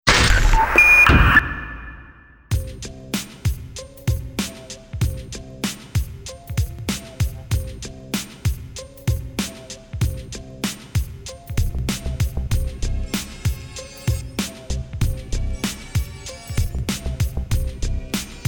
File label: effect and music bed